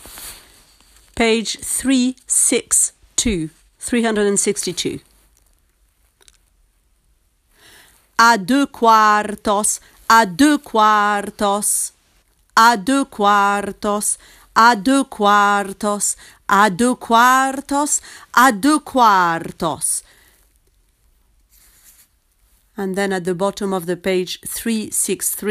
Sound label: tenor; Carmen